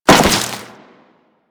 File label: Crewman Step Flesh, Footsteps; Warframe; Corpus